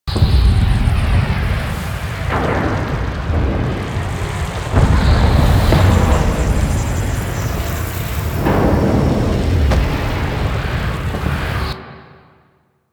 Corpus Gas City Reactor Meltdown Rumble Loop, Ambience; Warframe; Gameplay Remaster